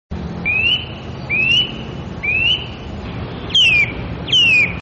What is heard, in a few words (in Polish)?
kowalik - trochę inny śpiew